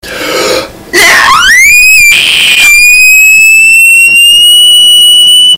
spoopy anime song

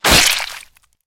axe chop flesh

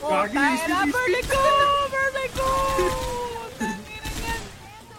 burning goo; jeff

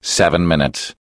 haaaaaaaa

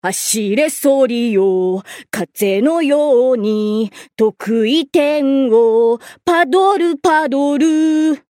jingle bells

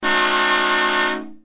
honk

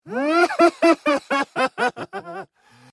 doctors laugh

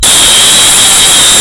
insane jumpscare sound; the kazgrins

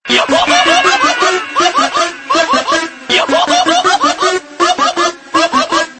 yohohoho

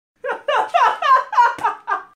laughing; jin

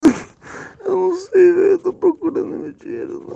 aaaaaahowwwwwhhaaaaaaoooooooa

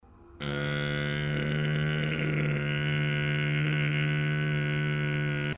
dying robot; jerma

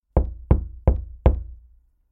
knocking on wall four knocks moderate

